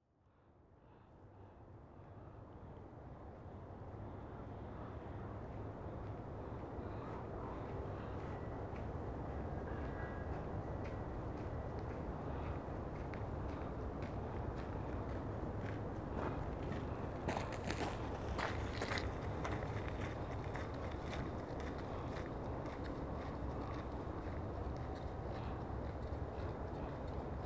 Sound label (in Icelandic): hrafn i laugadalnum